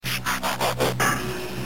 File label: Transform Sound